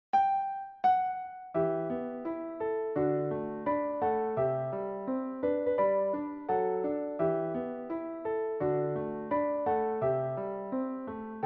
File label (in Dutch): sopraanblokfluit; Flierefluiter 6